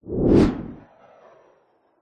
sound design whoosh fast powerful with jet like engine tail